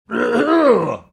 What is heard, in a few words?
making throat clearing sounds